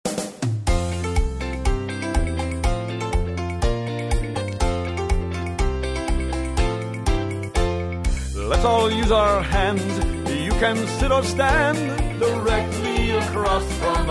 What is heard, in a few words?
slap happy